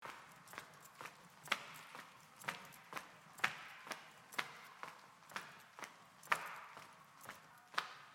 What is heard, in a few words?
soft footsteps in a cave